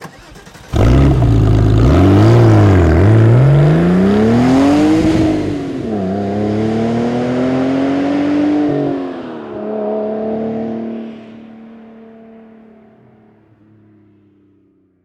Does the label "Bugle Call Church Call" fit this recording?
No